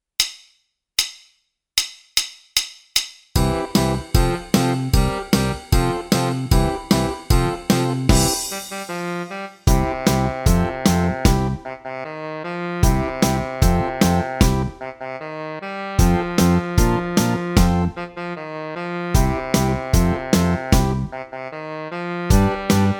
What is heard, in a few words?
Rockhouse Blues Track